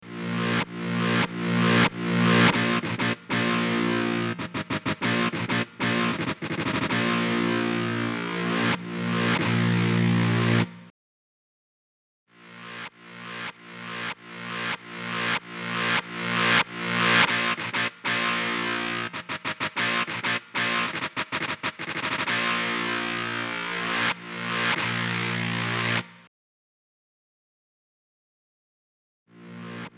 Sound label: Dry Dist EQ